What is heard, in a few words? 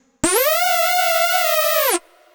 Android Riff, Funk; Dr. Fink's Funk Factory